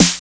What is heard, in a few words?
909 SPLAT SN, TECHNO; Drums In Your FACE!